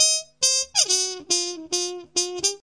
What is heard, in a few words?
acid jazz trumpet; HORNS SAX TRUMPET BRASS